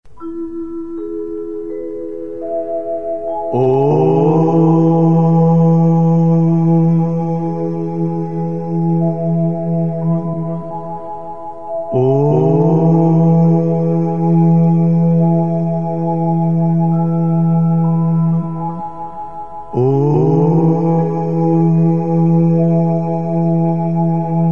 Om Dhwani Meditation Music

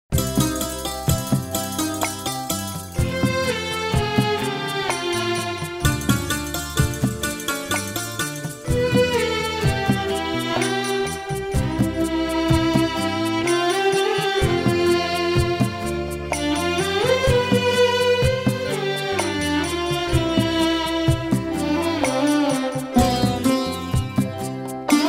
Instrumental

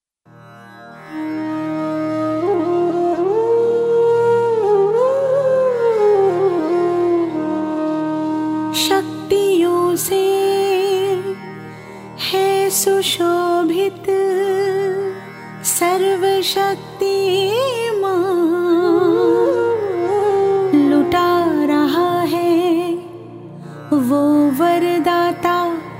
Solo Full Songs

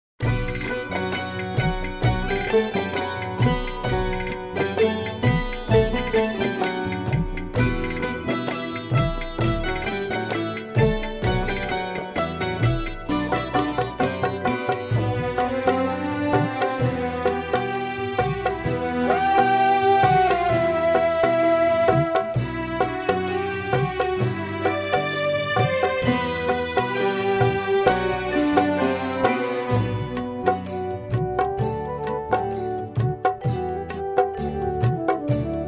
Instrumental

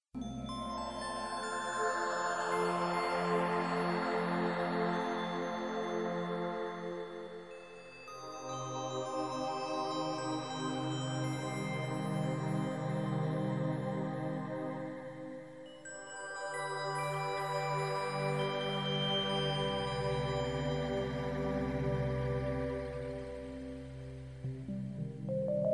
Meditation Music

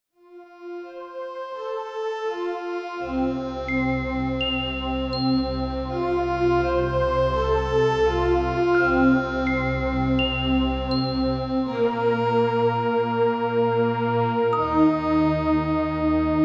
Gentle Meditation Music

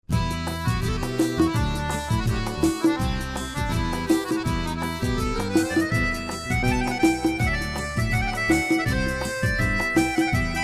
Instrumental

